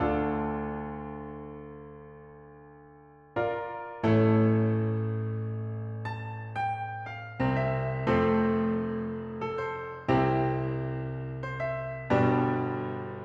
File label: Keys